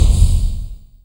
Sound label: FX Dance